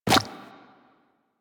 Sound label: Run Goo, Footsteps; Warframe; Tenno